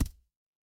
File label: impact thud